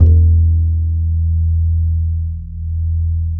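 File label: DB E1, double bass; Balkanish Traditions; USB Soundscan vol.63; AKAI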